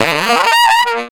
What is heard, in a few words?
brass riffs & licks; Total Drum&Bass